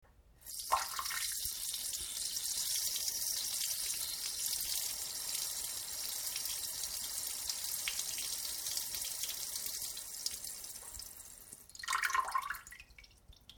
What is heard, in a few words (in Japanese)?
しょぼちゃしょーおしっこ